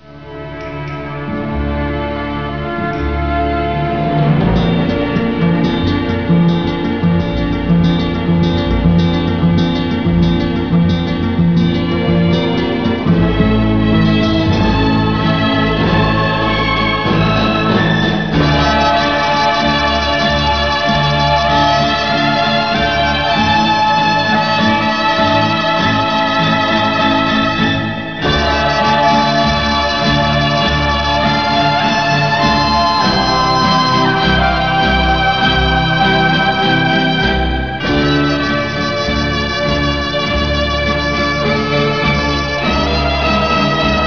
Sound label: trio